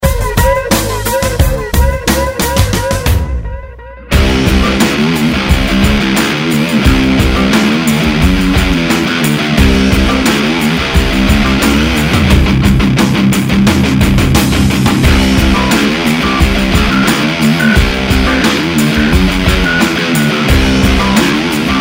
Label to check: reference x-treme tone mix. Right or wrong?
Right